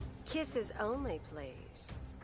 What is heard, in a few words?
Kisses only